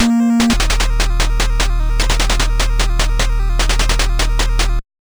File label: Victory; Cyberball; nes